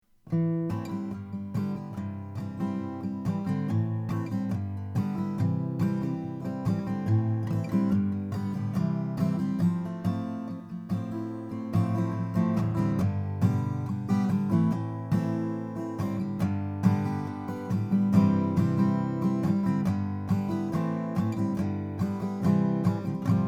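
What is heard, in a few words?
froggy bottom h12 madagascar 5a, guitars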